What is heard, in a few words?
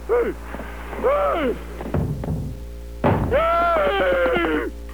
yell